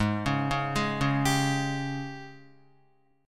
Ab Major 7th Suspended 4th Ab Guitar 4F,4A,5C,5G,5C,6E; arpeggiated; AbM7sus4; JGuitar